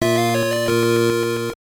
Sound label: Jingle; gambler densetsu tetsuya shinjuku tenun hen 2001 gbc